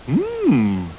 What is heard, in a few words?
mmm